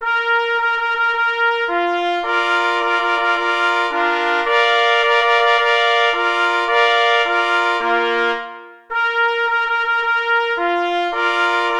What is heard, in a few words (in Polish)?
Fanfara dekoracyjna na 3 plesy